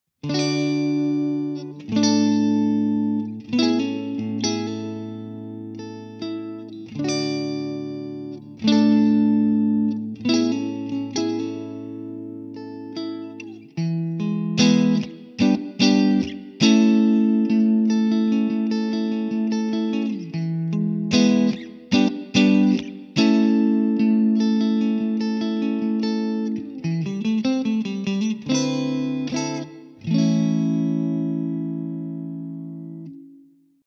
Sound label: clean; jensentone